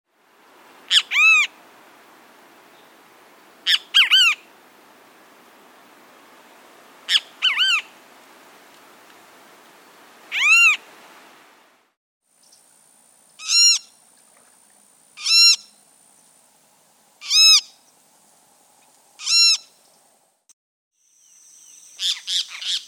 Great Kiskadee

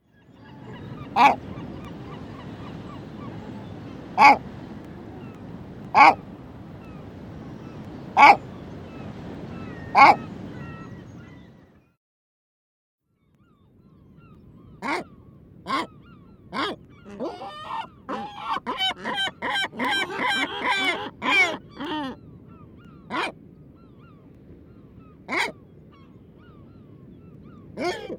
Great Black backed Gull

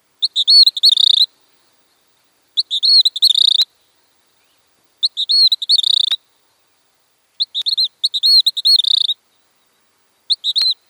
Gray Kingbird

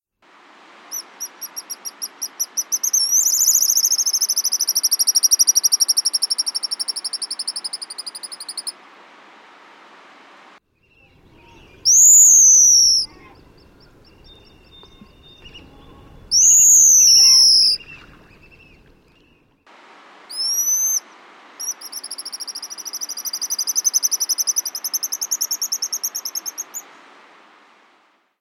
Pigeon Guillemot

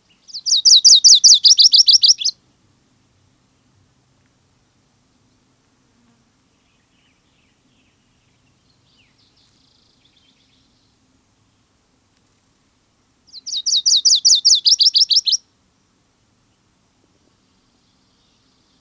Virginias Warbler

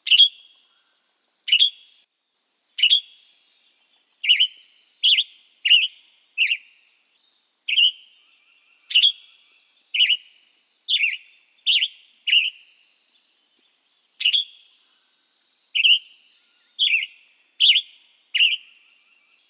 Western Tanager

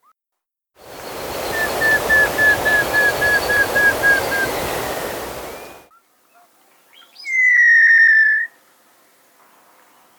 Montezuma Quail

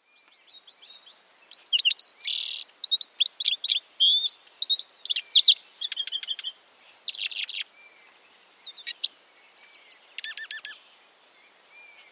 Lawrences Goldfinch

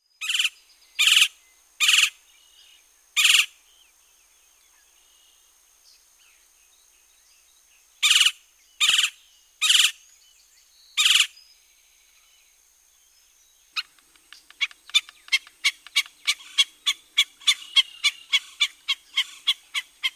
Golden fronted Woodpecker

